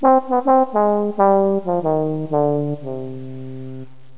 TROMPA; Pipo-Aprende musica con Pipo

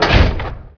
Escalera down; Grounch

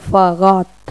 FAGOT; Pipo-Aprende musica con Pipo

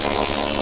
sierra hielo; Grounch

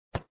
bote; FlashGOL; Esportes

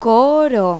CORO; Pipo-Aprende musica con Pipo

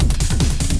ritmo; spanish pack n°1 by llfb

